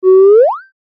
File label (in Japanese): ミョーン 覗き込む